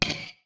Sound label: sfx, futuristic weapons sound effects